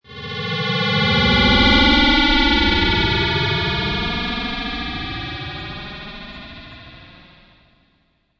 Starship Taking Off, Starship, alien craft, UFO, flying saucer taking off and flying by; with stereo effects; Lynne Music